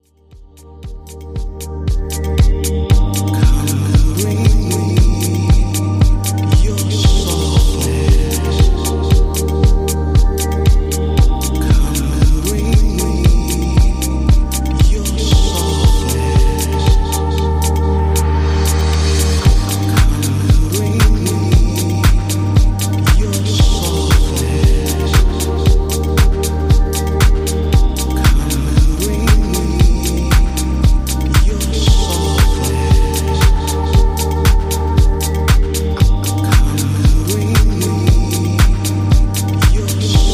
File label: drawer